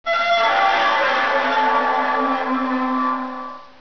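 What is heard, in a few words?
metal swing, doors; quake; fortress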